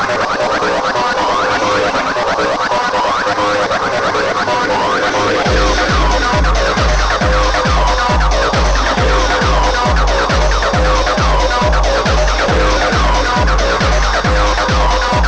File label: Melody